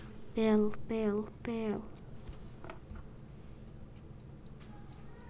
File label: bell